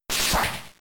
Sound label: Slash